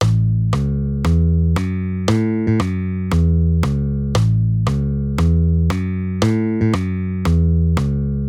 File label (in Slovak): basa, basove figury boogie